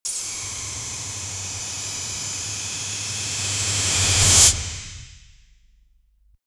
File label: dash slide friction shrr